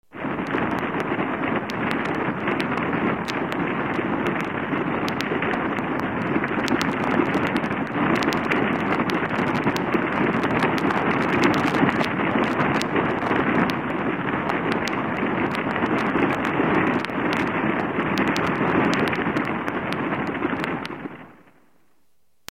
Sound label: Forest Fire; xp-80